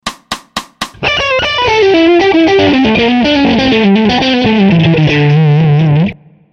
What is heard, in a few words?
Mixolydian Mayhem Lick; Fast